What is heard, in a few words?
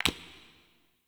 Ur Piece Place; UI; Minigames